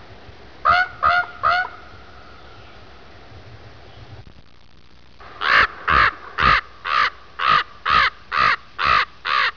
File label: common raven